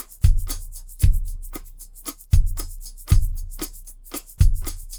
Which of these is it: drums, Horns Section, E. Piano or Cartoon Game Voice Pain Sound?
drums